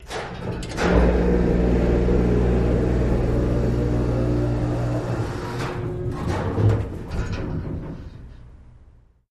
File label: Utility Elevator, elevators